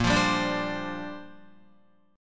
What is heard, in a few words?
A sharp 6th Add 9th, Guitar; A#6add9 strummed